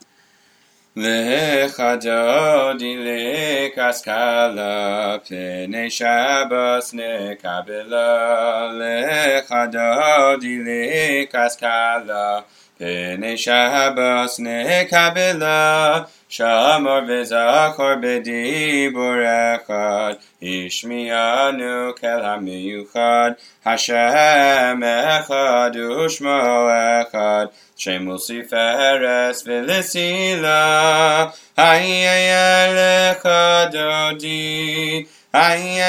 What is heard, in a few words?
Wedding Melody; Lcha Dodi Keitzad Mrakdim